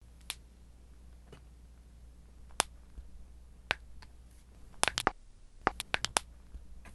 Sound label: Human Cracking Knuckles